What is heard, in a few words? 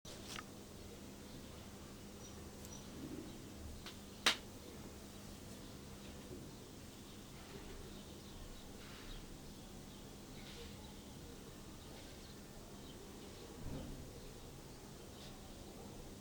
quarantine silence soundscape